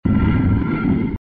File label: gate open se